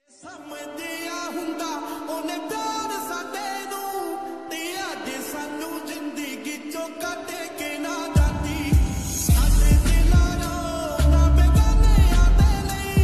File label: ai song ringtone